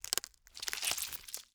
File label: gather meat